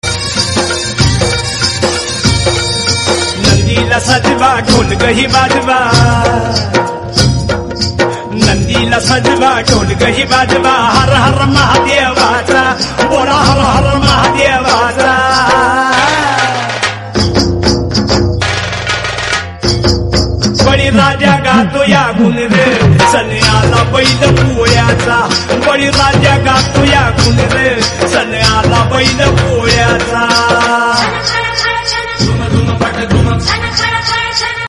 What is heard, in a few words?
SONG RINGTONE